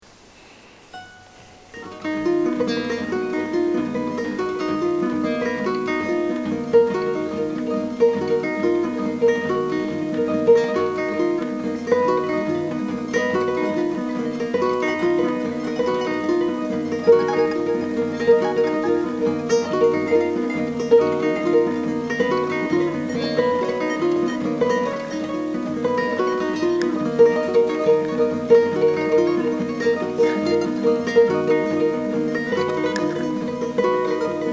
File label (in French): organe